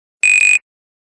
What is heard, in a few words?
Buzzer, Ringtones; Nokia; 3610